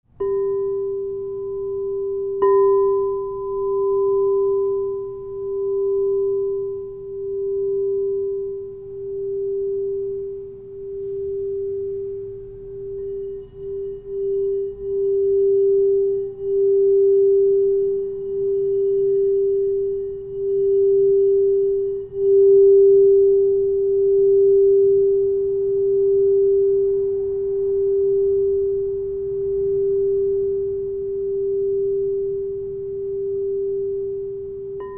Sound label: Crystal Tones Singing Bowls